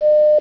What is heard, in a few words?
boop